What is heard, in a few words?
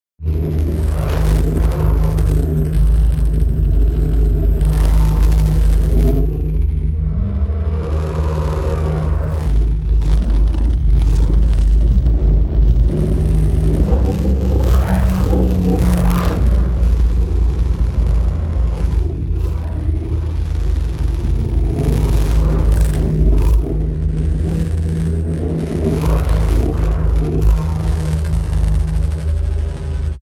TEXTURE; Honeymoon On Death Star; boom library; Neon